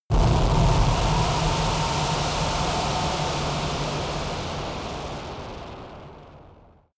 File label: shuttle air leak